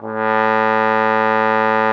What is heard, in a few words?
A2, TROMBONE; Xsample VOL-3